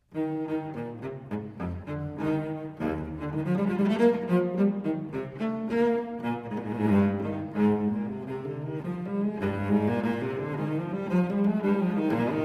Unaccompanied Cello Suite in E-Flat Major, Courante; No. 4; BWV 1010